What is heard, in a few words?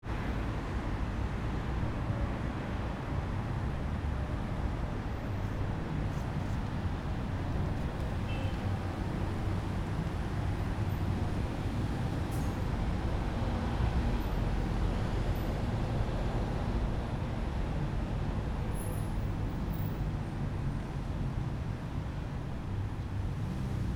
Small city main street dog pigeon; atmosphere